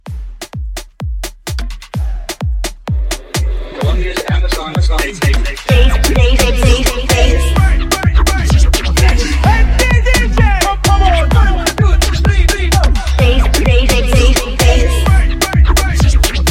Club Hype Edit 128

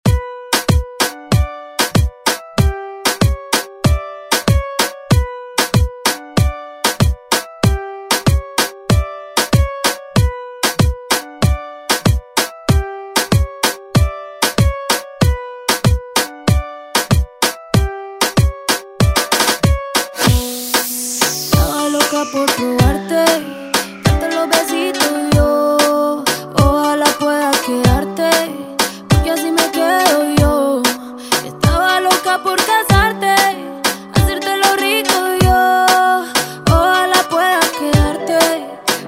Reggaeton Redrum Intro

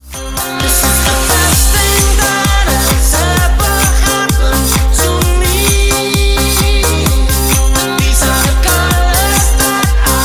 130bpm Cm

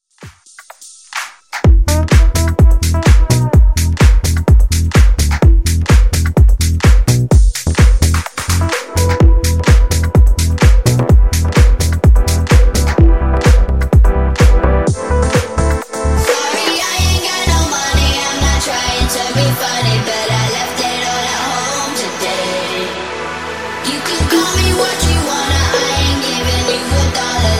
Remix CLEAN 127Bpm

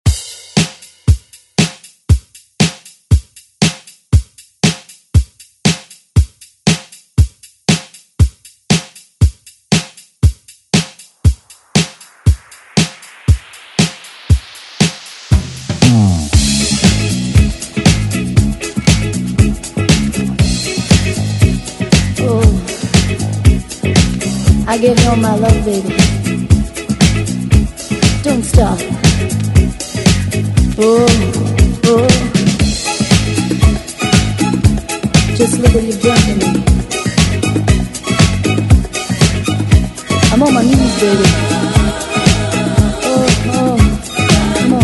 70s; ReDrum

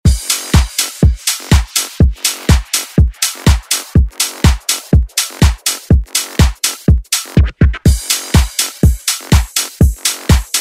Dance

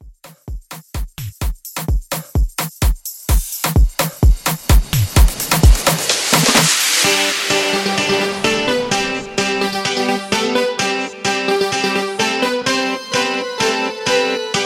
Afro 128

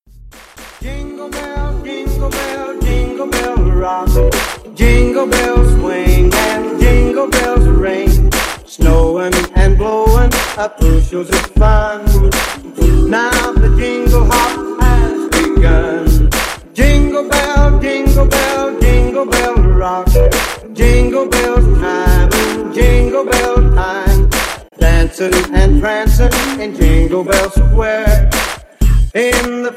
Xmas Mix; Remixfreakz

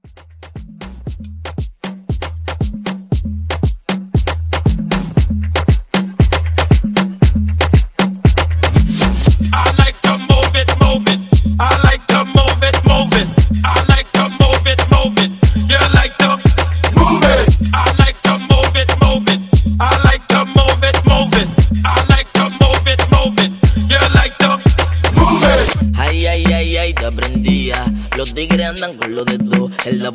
Latin Hype 117

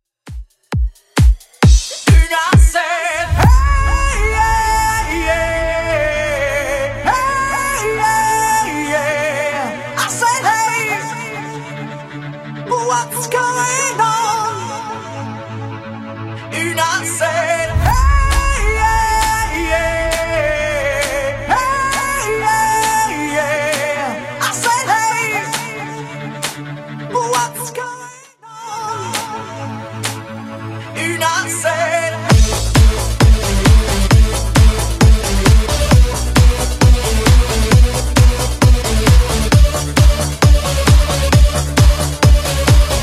90s Epic Intro Redrum 133 bpm

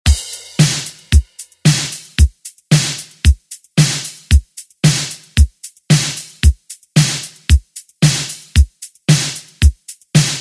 80s ReDrum; DJ Mhark

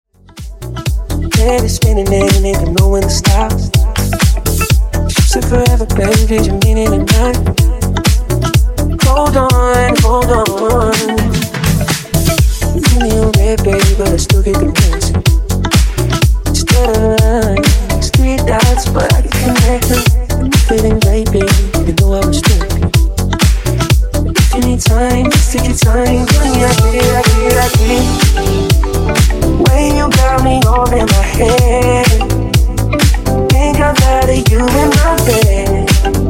Dizko Funk Remix 125 bpm

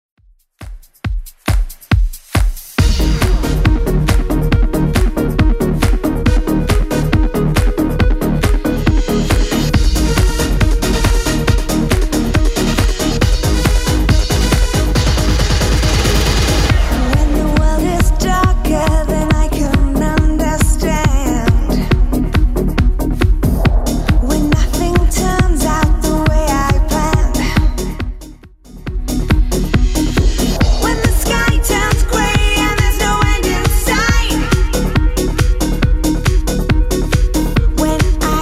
Dance Redrum, 138 bpm

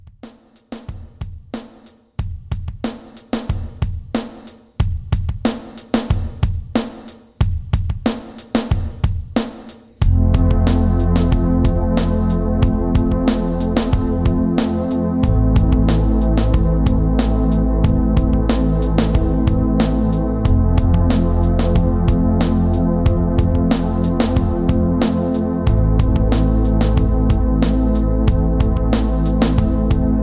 90s Pop Mixshow 92

